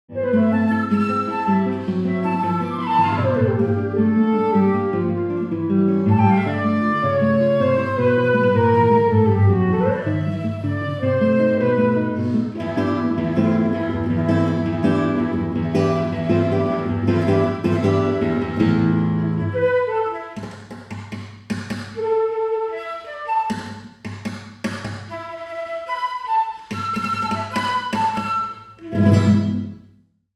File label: flute guitar left right IR room